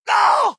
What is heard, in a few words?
hurt heavy; joker